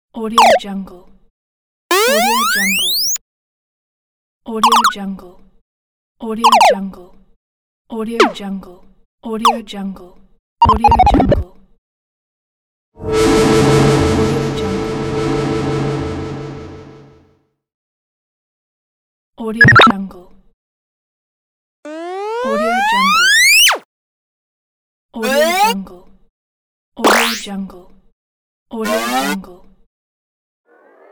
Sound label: vintage game pack; teasound